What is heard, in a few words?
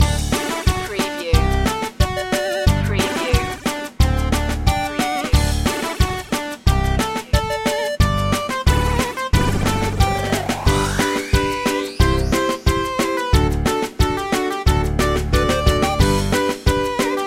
retro video game bubbles loop